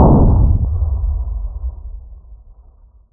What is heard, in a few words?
distance boom; WAR